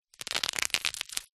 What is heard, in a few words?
office bubble wrap pops